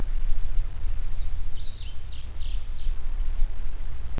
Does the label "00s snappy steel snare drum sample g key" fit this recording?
No